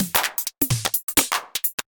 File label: Tech style 128